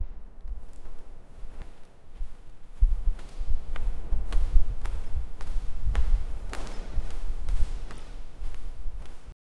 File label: sacred silence deep bell; bow